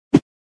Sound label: Fast whoosh metal crowbar